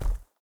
bear footstep wood hard; Rust

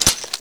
Inf Weapons Foley 3rd; Red Orchestra 41-45

